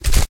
Falldown; Aporkalypse Pigs of Doom

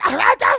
Fight; Piglet; Neighbours From Hell Console Edition; GC, Xbox

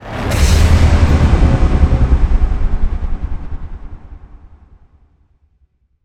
Stinger Modulate

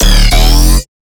Bass Growl Slidey 200 D

